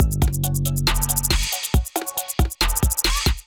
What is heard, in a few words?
Kit groove 69 bpm; MIDI Clips; Takeover Kit groove; Ableton; Factory Packs; Beat Tools